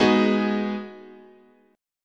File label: Ab Minor 7th, chord, guitar